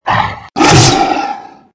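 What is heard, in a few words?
werewolf; langren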